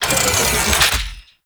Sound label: Excavator Drill Loop Stop; Ambience; Warframe; Infested Eris; Encounters